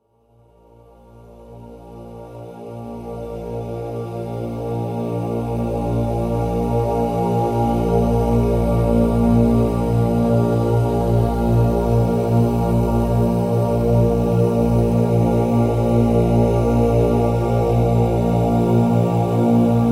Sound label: Om Mantra Theta, binaural music